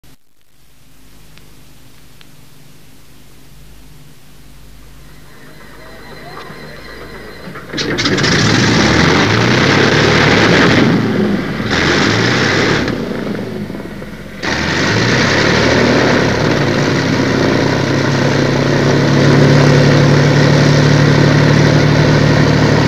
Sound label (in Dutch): Motorgeluid